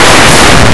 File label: book